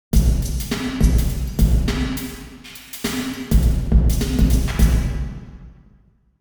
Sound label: drum, church model; St Patricks church Patrington